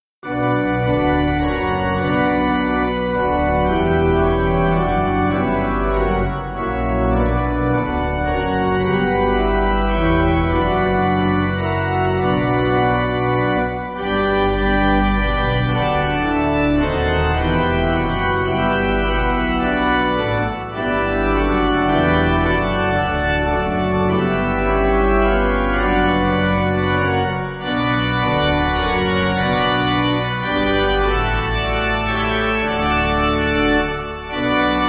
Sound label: Pipe Organ, Music Backing; And can it be that I should gain; Lansdown